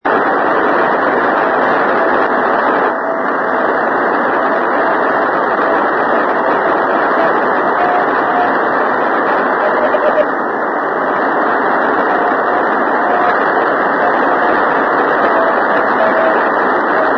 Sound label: radio, 12775 khz; veracruz radio xfu